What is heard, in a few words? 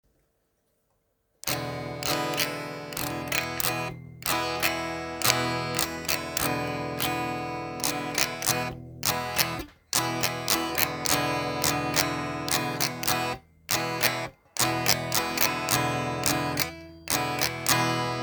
E7 E Am Amadd4 C C7 F Fm